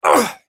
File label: male impact grunt with exhale